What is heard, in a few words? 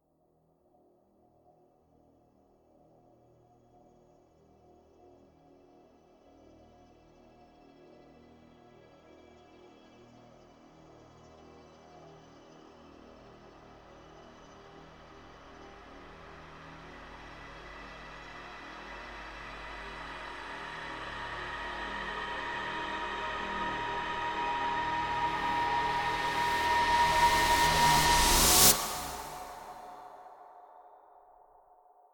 Horror Build Up